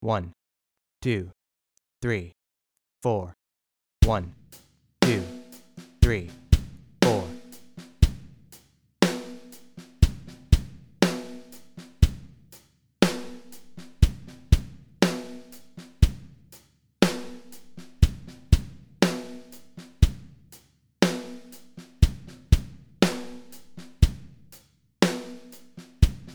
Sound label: Ghost Notes